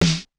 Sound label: snare, snare house